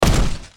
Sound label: foot; blackknight